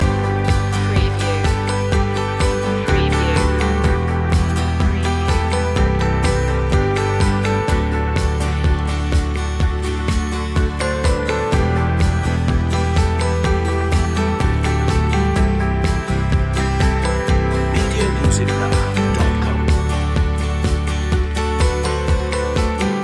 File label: summer in june loop